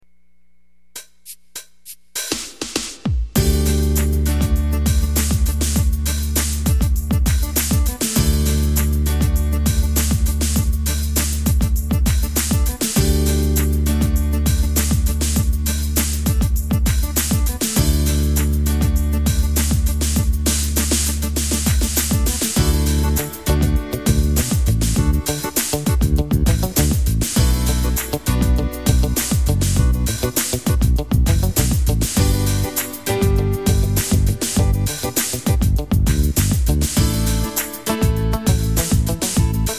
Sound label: pop; yamaha